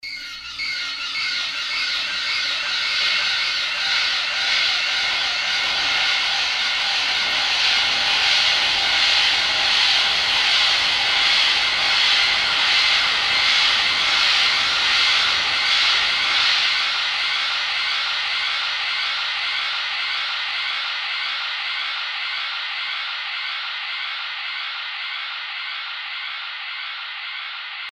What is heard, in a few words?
ayurvedik sounds